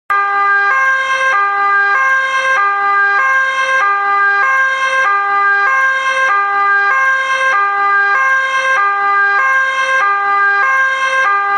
nederlandse politie sirene

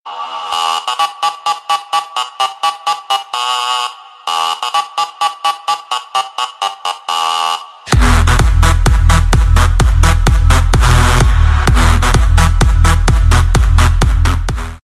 trompet

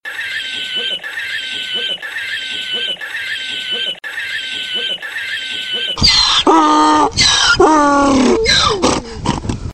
donkey fietspomp

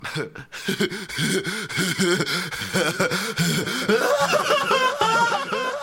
domme lach

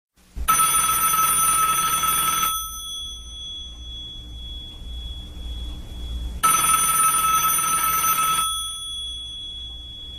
telefono

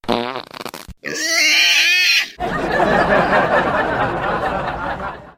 varken lachen; scheet

